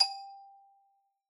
Xylo Hard G4 pp far, Hard Mallets

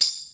tamb, Tambourine

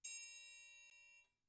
triangle hit mf; Idiophones; Struck Idiophones; Triangles

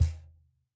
Cajon hit p; Idiophones; Struck Idiophones

